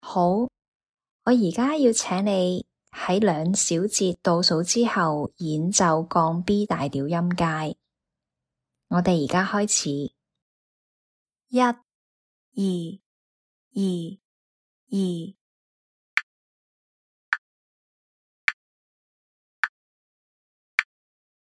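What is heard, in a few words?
B flat major scale D flat major scale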